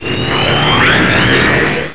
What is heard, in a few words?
Dark Blast Charge; Vaati charging up a dark blast (also used when Vaati's Wrath is defeated)